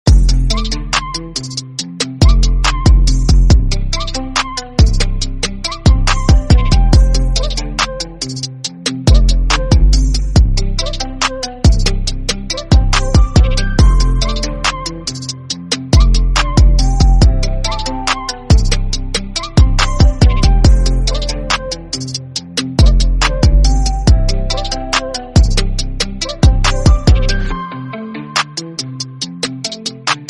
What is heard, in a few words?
instrumental song